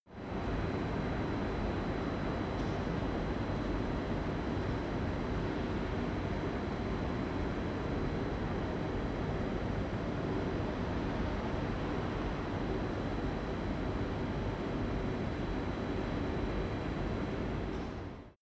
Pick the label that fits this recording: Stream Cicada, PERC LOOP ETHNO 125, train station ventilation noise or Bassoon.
train station ventilation noise